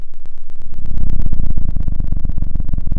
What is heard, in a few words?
pop, Sound of a cat purring